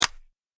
multimedia button click; Clicks; McKinney Sound

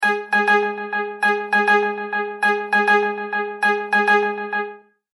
Multimedia Tone-Thinking/Processing

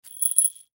Key Slide, Key Slide Cement; Foley; Blastwave

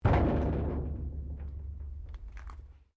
METAL THUMP QUIET; Metal (Sound Effects:Materials)

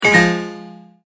multimedia tone error

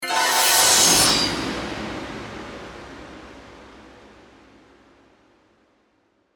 Magical Haunted, Scifi, Magical, Haunted, Spell; Blastwave

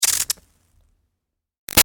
Sizzle Iron Electrical Zap; Zaps; Electronics; Big Room Sound

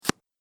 Cards Card Placed; Card Sound Effects; casino sound effects

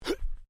MALE VOCALIZATION HUH; Exclamations; Voice

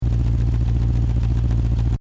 Engine: Tick Over Revs Loop, car; Cars; Vehicles; Car Sound Effects; Stuart Duffield

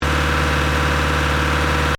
Engine: Medium Revs Loop, car; Diesel; Car Sound Effects; Cars (Sound Effects:Vehicles); Stuart Duffield

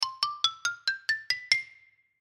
Xylophone Scale, Xylophone Scale Up; Mallets; Blastwave

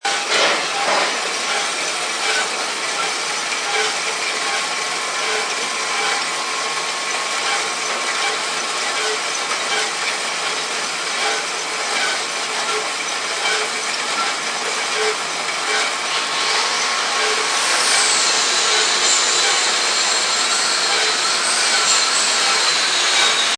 Industrial Factory Ambience, Factory ambience; Backgrounds; Factories

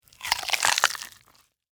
Flesh Rip Slow; Horror; Blood And Guts Sound Effects

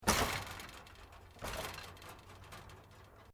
Door Wooden Gate Old Slam; Various doors; Gates

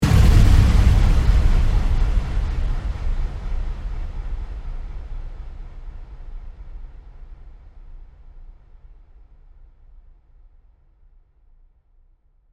Hit Sputter

